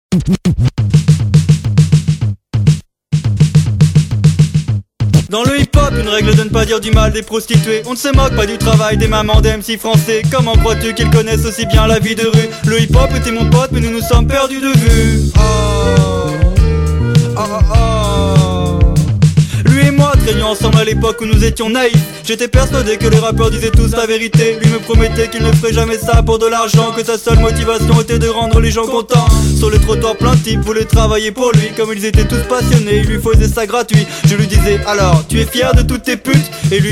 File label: hip hop